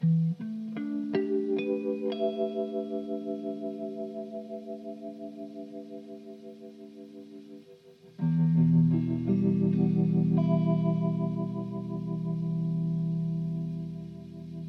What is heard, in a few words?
Vox AC15 vibrato